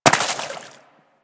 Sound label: Bullet Impact Water; Warframe; DE; Weapons